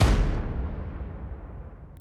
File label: MOVE; C64 Dreams; Multi-Sound Default